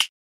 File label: fingersnapping